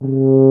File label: tuba; Miroslav Vitous - Solo Instruments VOL-2